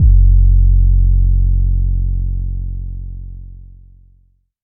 phonk kicks oneshot - 808 f sharp, Specially designed for phonk type beats, these nasty, layered 808; kick drum